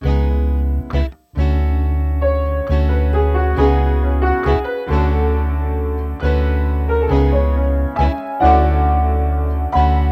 136 BPM C# Min; Cymatics; Zodiac Vol 2 Beta Pack; Zodiac Vol 2 Premium Compositions

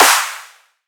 Riddim Snare Clap

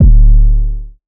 808 D; 808s; Cymatics; Cobra Hip Hop Sample Pack

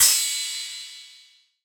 crash, cymbals, drum one shots; Crashes; Cymatics; Cobra Hip Hop Sample Pack

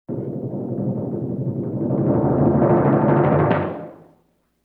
LoFi Timpani Roll; Vintage Cartoon; Drums & Percussion

